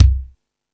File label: Jazz Drums, E-MU Pro-Cussion